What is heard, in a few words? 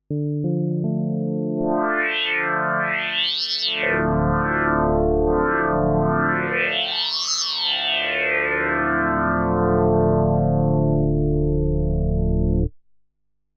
ASR 10 Transwaves